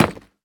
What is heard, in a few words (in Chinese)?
下界砖破坏声